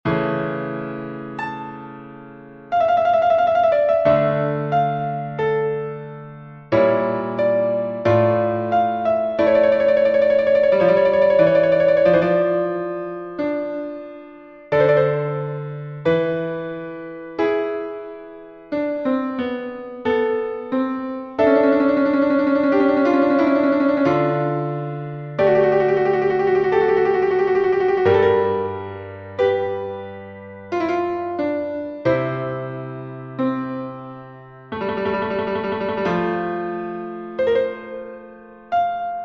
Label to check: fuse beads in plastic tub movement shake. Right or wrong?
Wrong